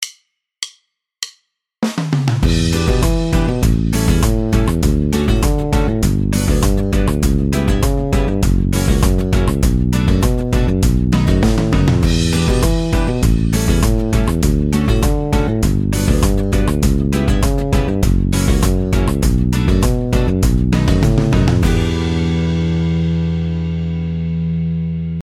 octaves exercice, basse; exercices

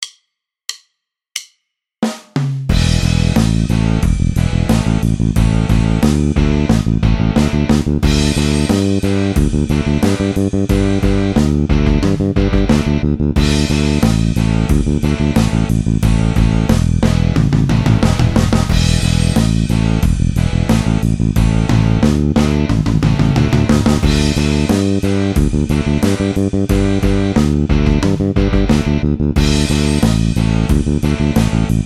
main droite exercice, basse; exercices

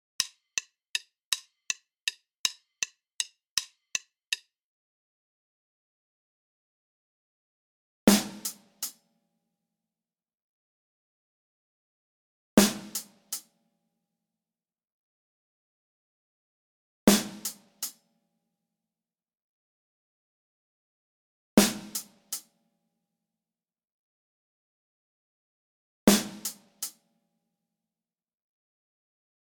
decalage triolets exercice batterie tempo 080; exercices

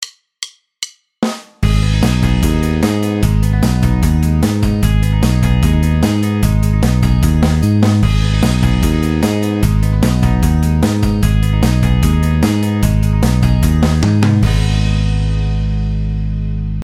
arpeges exercice, basse; exercices

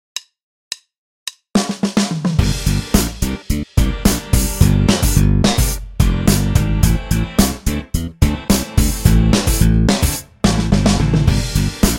notes piquees exercice, basse; exercices

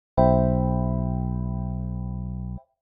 accord do majeur 7 basse si; exercices

